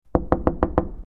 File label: Knocking on door